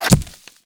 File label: incoming bullet hit ground; Mount&Blade Warband